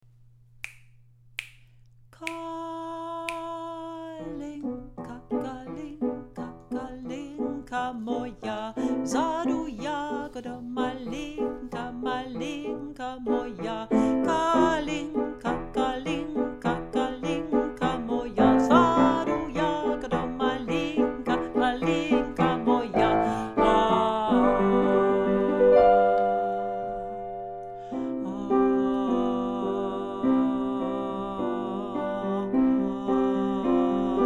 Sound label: kalinka tenor